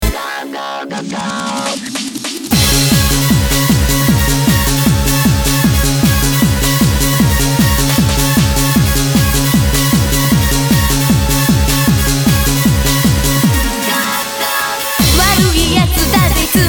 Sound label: Eurobeat; Animation Eurobeat Series Parapara Max 4; City Hunter 2